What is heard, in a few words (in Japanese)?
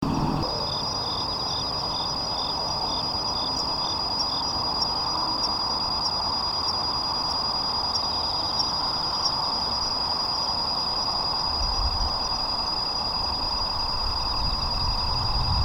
kumasuzu; ヤブキリ